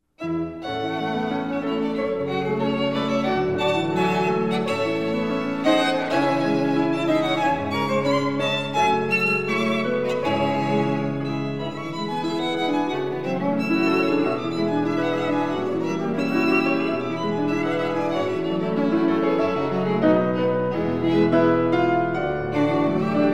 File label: serenade; sinding